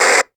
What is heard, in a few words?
Walkie Talkie Static; Communications; 1001 Sound Effects